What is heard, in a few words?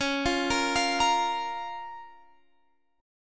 A sharp Minor